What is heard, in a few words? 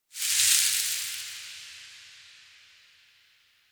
Atmo Random Debris